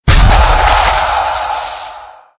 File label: Mutant Death Shriek